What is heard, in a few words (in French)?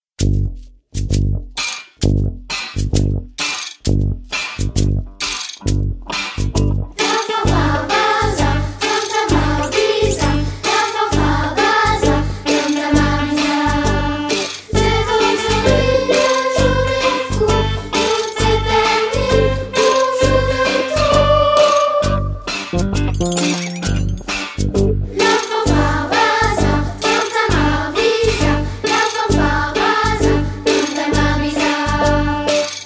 fanfare bazar